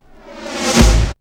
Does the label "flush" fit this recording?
Yes